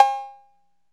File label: PRC 808, 808 Cowbell, Roland; Northstar - Drumscapes Roland; Bonus Perc